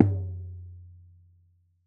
Frame Drum Medium, World Percussion; Cymatics; Emperor World Percussion

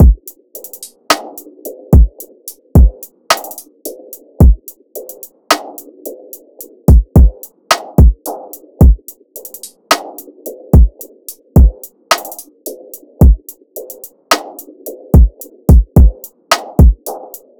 Drum Loop, 109 BPM; Slowed Time; Cymatics; Infinity Drum Collection

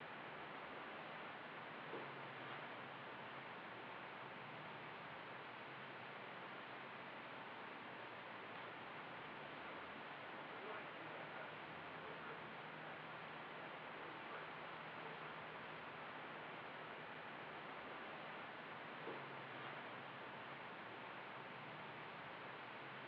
80 BPM F Min Noise Texture; Melody Loops; If You're Not Real; Cymatics; ANALOG EVOLUTION Beta; Lofi Collection

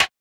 Percussion, Hip Hop; Cymatics; 9God Hip Hop Sample Pack

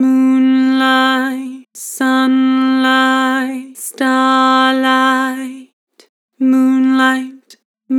Vibrato, Vocals; Moon sun starlight; Harmor Vocals

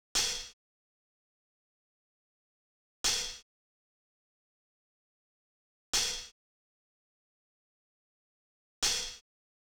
Drum Loop, 166 BPM Open Hat, Trap; Loop Stems; Chamber

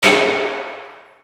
fx hit, Cartoon Film Effects

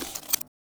Glitch

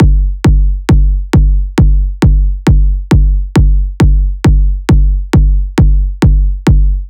Kick Loop 135, Techno Drums

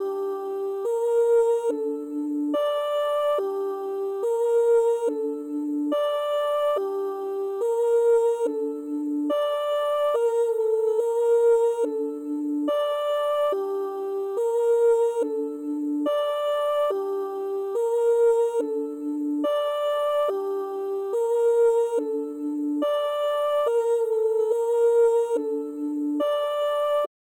142 BPM G Min Vocals, Drill; Cymatics - 2022 Melody Collection - Drill; Peng

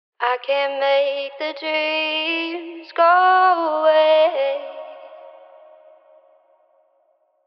Phrase Wet - Dreams - 79 BPM C# Min, vocals; Cymatics - Eternity Sample Pack; Phrases

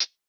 Closed Hihat; Cymatics; Eternity Sample Pack; Drum One Shots; Cymbals

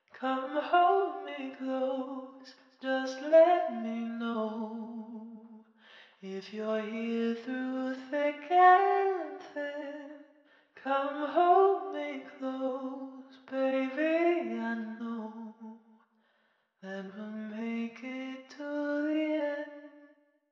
90 BPM G Min Vocals; Cymatics; Thick & Thin; Infinity Melody Collection

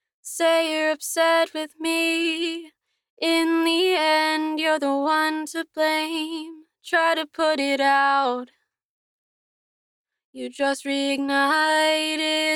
Main Vocal, 130bpm E Major DRY, Acapella; Advent Calendar - Day 1

